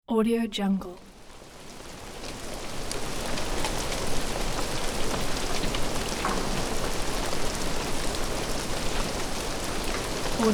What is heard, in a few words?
rain